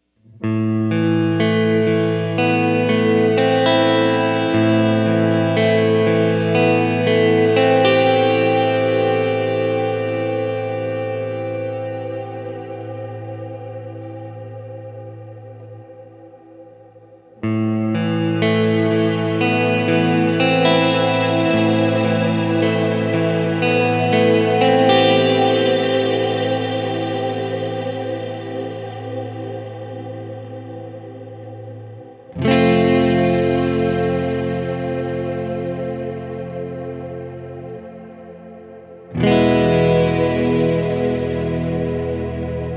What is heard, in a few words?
plate Mod Min then Mod Preset; BigSky; reverb; Piled High